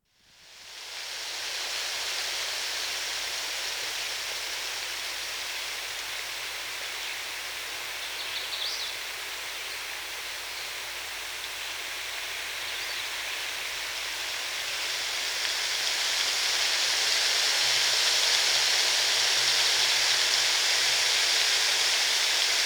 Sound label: Steady Rain Falling In the Forest; Dr. Sound Effects; 210 Nature Sounds - 20 Hours of Relaxing Natural Ambiences for Meditation and Sleep